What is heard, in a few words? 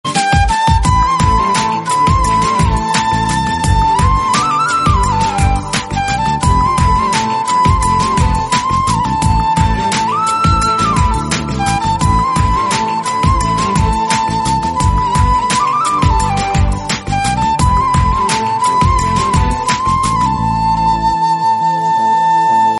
instrumental ringtone